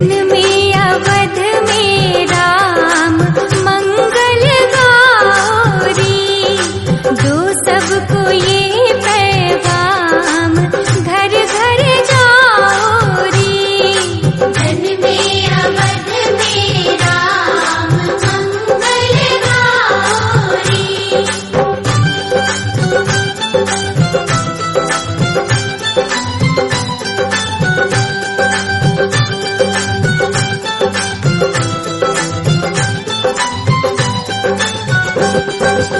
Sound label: devotional bhajan hindi; siya ram jay ram jay jay ram